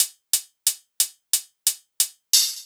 Hi Hat, звуковой эффект хип хоп

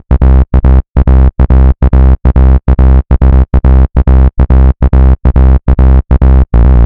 Tekknician, бас-сэмплы техно транс

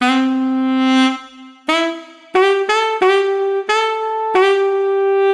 Saxo, трубы

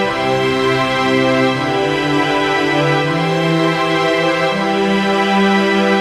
Orchestral, струнные

